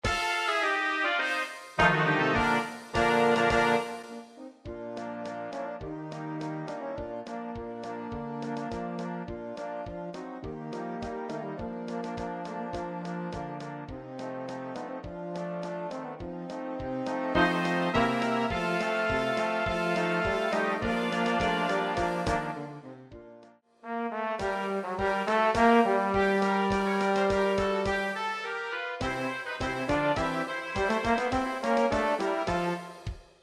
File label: Polka; Ziegelstein Polka